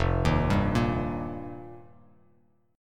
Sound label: F 7th Suspended 2nd Suspended 4th, Bass Standard; F7sus2sus4; arpeggiated; JGuitar